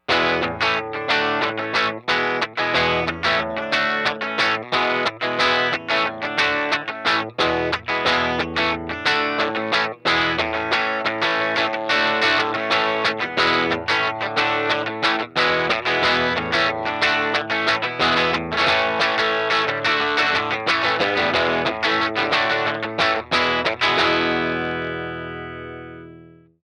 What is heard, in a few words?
Tele top inst channel low cut off digging in